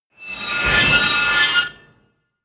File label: metal metal grind large